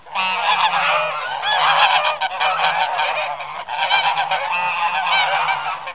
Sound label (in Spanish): gallinas